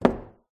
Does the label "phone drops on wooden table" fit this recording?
Yes